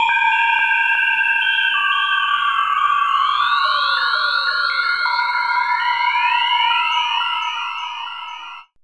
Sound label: SPACE ATMOS